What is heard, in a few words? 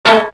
doink